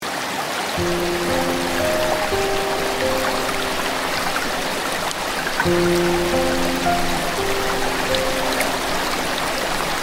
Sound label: Japanese Onsen